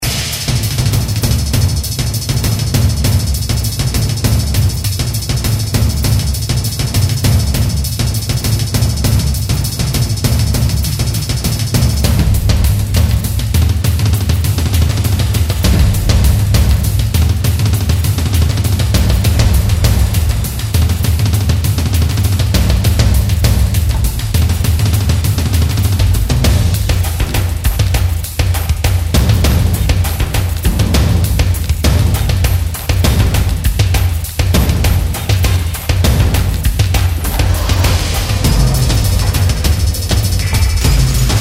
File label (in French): série poursuite rapide